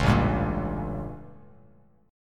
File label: A 7th Flat 9th A, Bass Standard; strummed